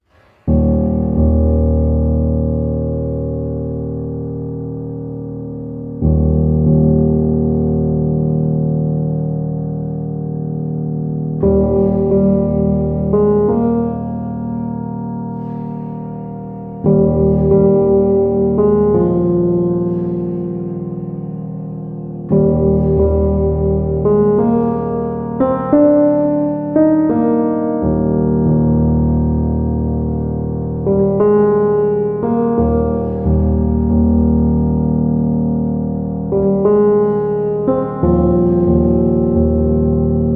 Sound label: Forest Morning, Peaceful Piano Music